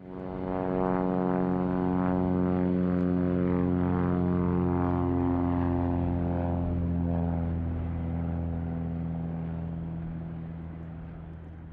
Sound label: aircraft